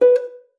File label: STOP A#4, harp; Xsample VOL-8; HARP+FX